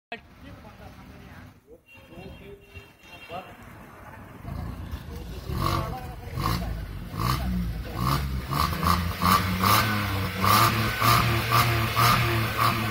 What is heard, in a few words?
Datsun GO; ماشین